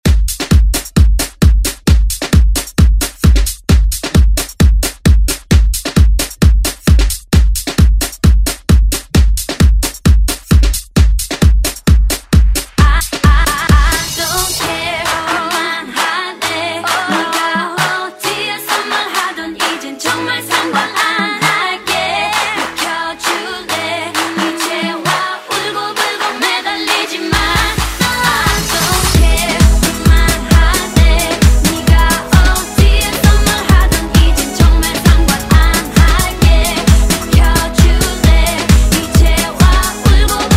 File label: Afrobeat Redrum